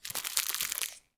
trash empty

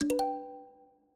game over winner; stereo

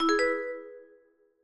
message new email; stereo

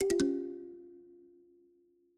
game over loser; stereo

